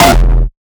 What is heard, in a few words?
kick oneshot monster E; GhostHack